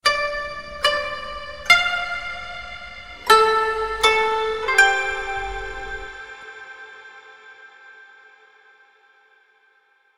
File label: Koto; SparkVerb